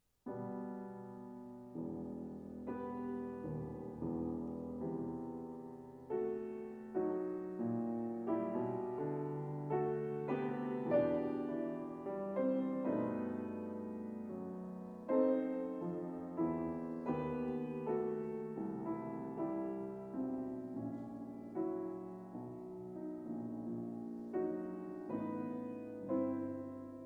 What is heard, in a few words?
Sonata For Flute and Piano - Mvt. 3. Largo con dolore